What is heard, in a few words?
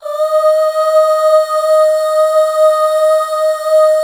UUHH D#5 R, Hallelujah, BACKGROUND; Best Service